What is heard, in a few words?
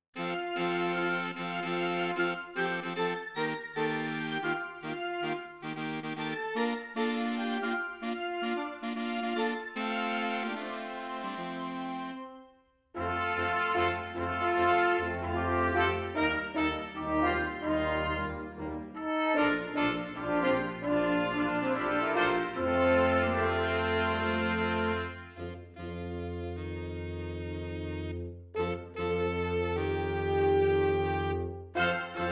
Rain